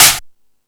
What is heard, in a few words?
Found Sound, Drum Machines